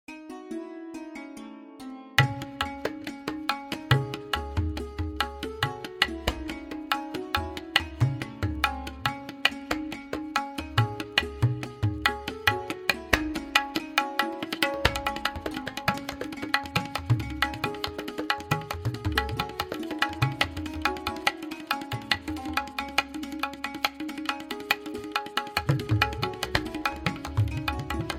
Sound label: Dharatatinatinara, tabla